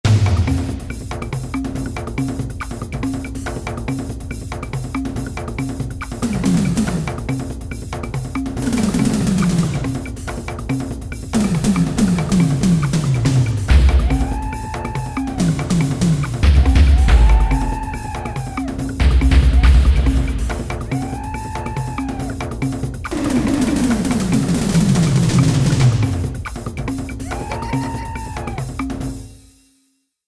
Drumfest, synth

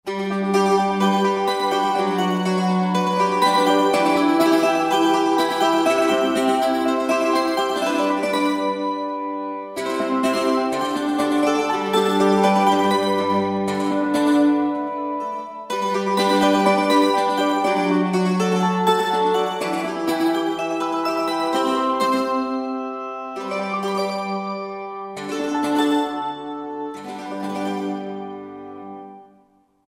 Circle Pick; Country; Roland SR-JV80-17 Country Collection

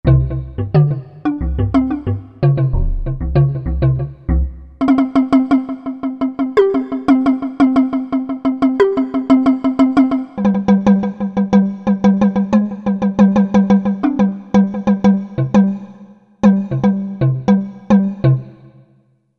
Dumbek, Korg MSC-10S Ethnic

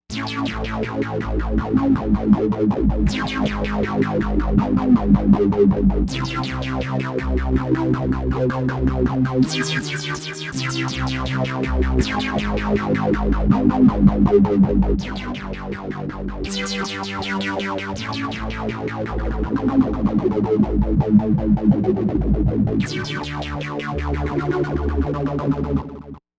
Bass, Novation UltraNova; Factory Patches; Movo Bass

